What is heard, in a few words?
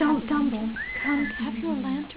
gate